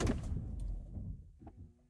negromkij zvuk zakrivaniya dverej avtomobilya

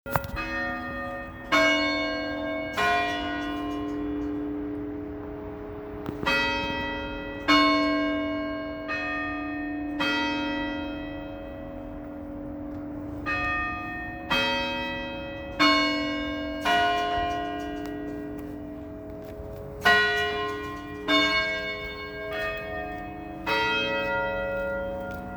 polevaya zapis zvona istoricheskogo kolokola; el kampanil

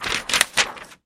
shelest listkov bumagi iz knigi

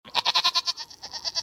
miniatyurnaya molochnaya koza nigerijskij karlik

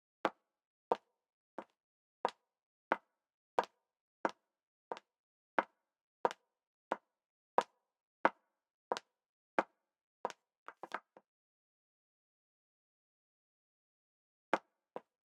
visokie kabluki nastupayut na parket

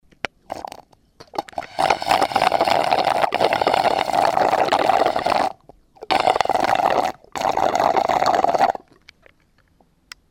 visasivanie poslednej porcii napitka cherez solominku

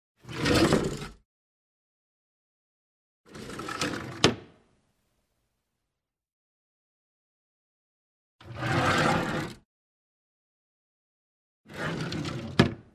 derevyannij pismennij stol yashiki otkrivayut i zakrivayut

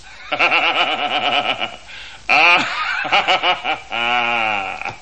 zhutkii smeh odinochnii muzhskoi zalivnoi dolgii

